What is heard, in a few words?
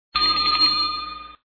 correct